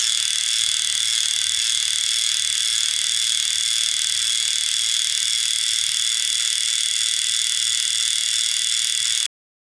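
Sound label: electric hand massager motor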